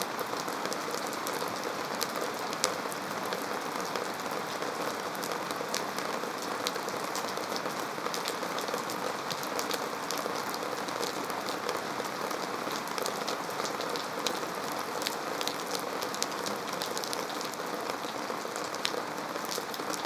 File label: environment rain outside wood